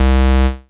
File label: Synth Bass; Vengeance Samples; VEE Synth Shots